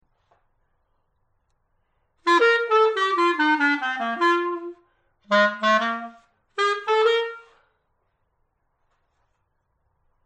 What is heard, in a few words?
clarinet